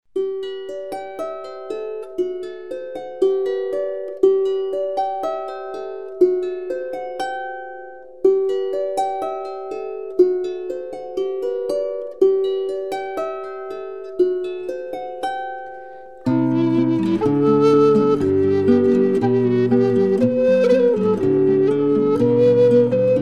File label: Instrumental; Besuche das mystische Land Anemoia. Elfe Evi führt dich an magische Orte, heilsame Plätze